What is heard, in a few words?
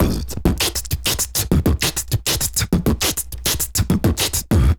Beat Box, Hip-Hop and RnB Vocals